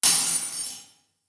Diner Dish Walla; the princess and the frog

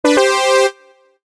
New Character Jingle; Dumb Ways to Die 2 The Games; Android

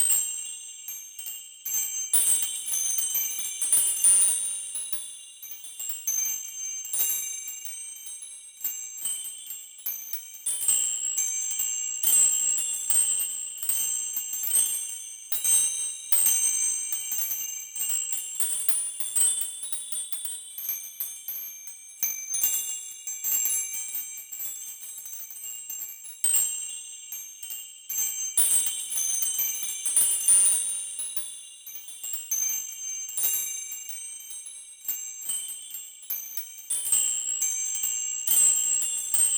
Ambient Chime; Levels Hub Restaurant 01 Act Closet; Fx Ambient Chimes

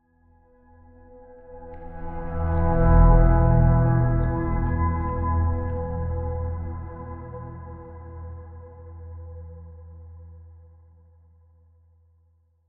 falling; roguebook

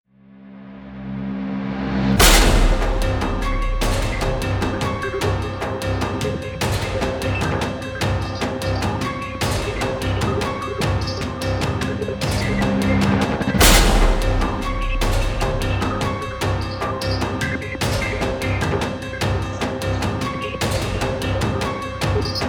boss coastal normal, 150 7 8; rogues like us

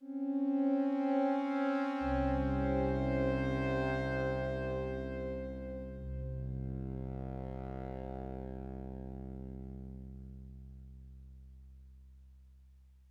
Music End Game Fail

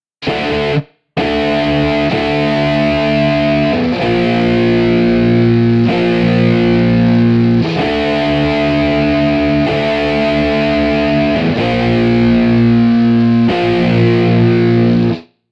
Guitar Dub

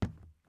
plastic step; teardown; ps5 windows xbox series xs

